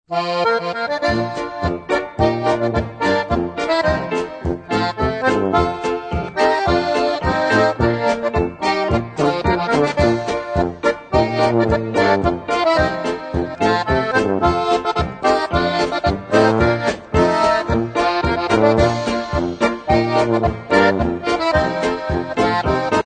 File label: FLUTOPHONE POLKA